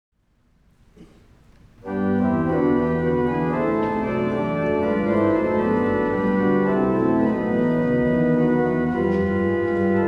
Chorale Preludes on Allein Gott; Op 135a; Rheinberger

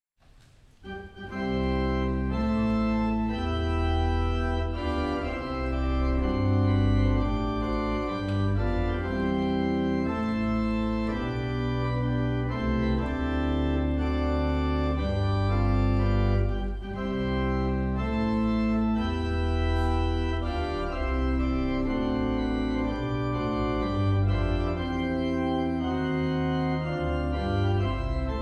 Marche Triumphant; Sassmannshausen

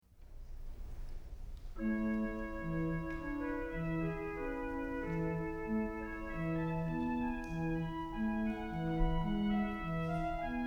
Melody, organ

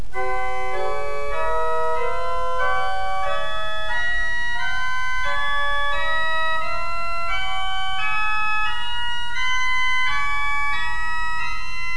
mixture, organ

